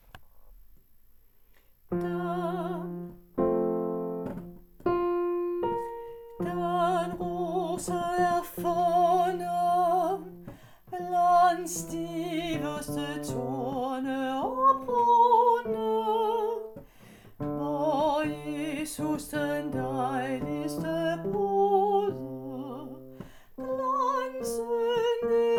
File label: BAS; Den yndigste rose er funden